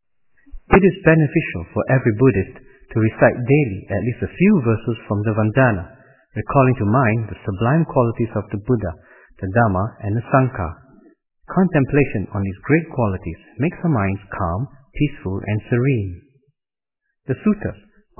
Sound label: chant, Buddhist Chanting